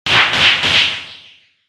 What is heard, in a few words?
punch; 攻撃